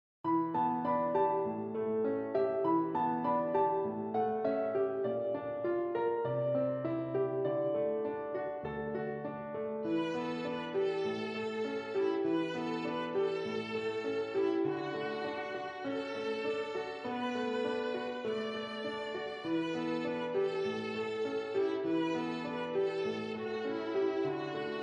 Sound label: Two Pieces for Violin and Piano